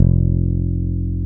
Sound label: Basses, Roland